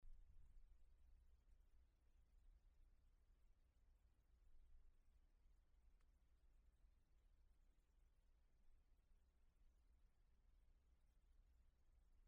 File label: noisefloor